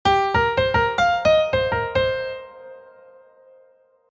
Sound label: blues lick